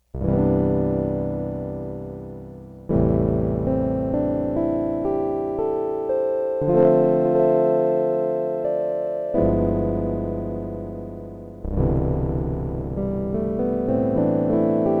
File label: Low Piano, Multivox MX-20